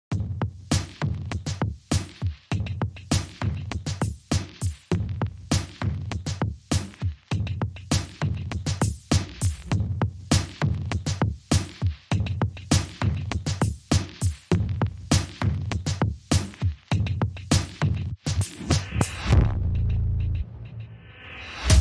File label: Drums Only